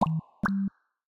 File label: Drip Drop, notifications; Samsung Galaxy S25 Ultra